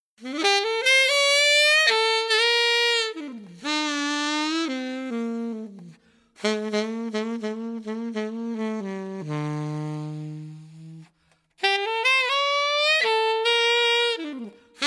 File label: Sleazy Sax; APM